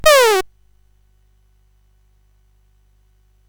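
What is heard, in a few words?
Moon Patrol, MOS 8580 SID chip music, games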